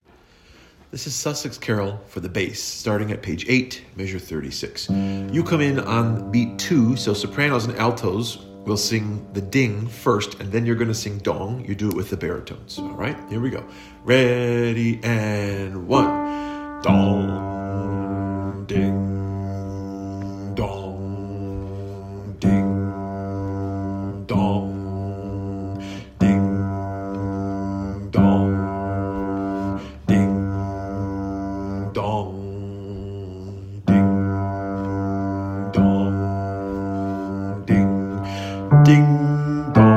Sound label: bass B2, adult choir; Sussex